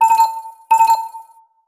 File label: Comm Chirp